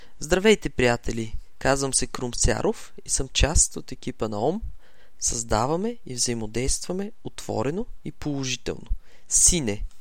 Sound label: sine; Radoslav